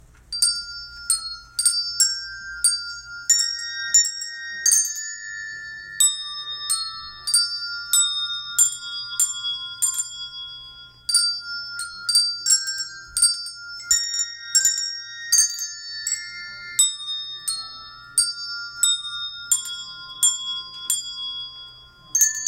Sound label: As With Gladness Bells